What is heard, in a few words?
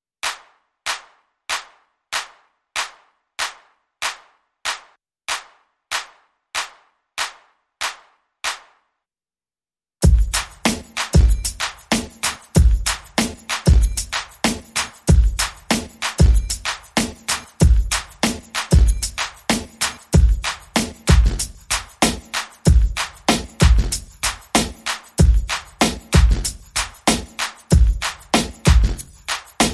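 Drums and Percussion Only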